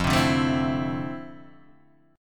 F Major 7th Add 6th, guitar chord; FM7add6 Chord {1 3 0 2 1 0} strummed

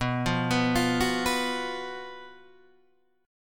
Gb Major 7th Suspended 4th B, guitar

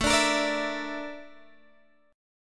A sharp Major 7th Flat 5th A

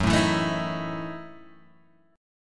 F sharp Minor 13th, Guitar, Open G

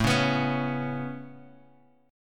A Major Add 9th A Guitar Open F, chord; Aadd9 strummed

